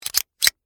Metal Slide Latch, Foley, Metal, Slide, Latch, Open; Latches; Objects; Blast Wave